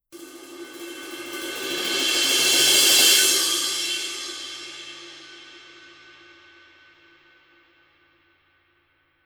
formula 602 ME 22 crash roll; paiste; modern essentials

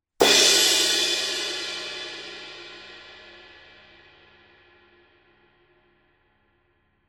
masters 20 extra thin edge, paiste

